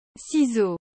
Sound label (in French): ciseaux